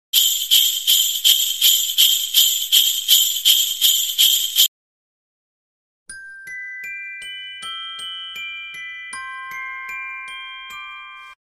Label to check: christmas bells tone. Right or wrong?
Right